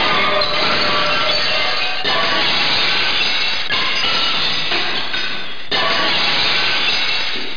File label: GLASS